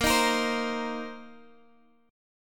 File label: C Minor 7th A sharp